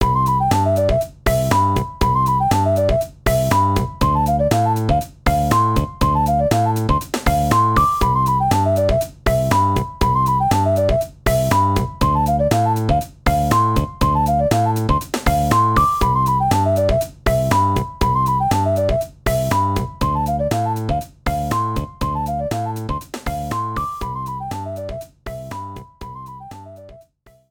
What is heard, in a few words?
Setting; Ginsei Tsume Shogi Portable; PSP